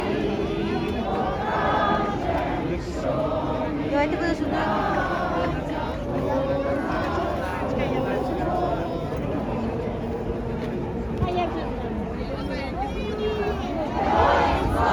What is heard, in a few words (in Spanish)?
ucranianos himno